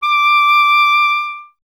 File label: clarinet D; Miroslav Vitous; Solo Instruments VOL-1